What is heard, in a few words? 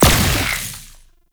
Pistol Projectile Embed; Weapons; Warframe; Tenno; Temple Signature